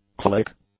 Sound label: click; nouns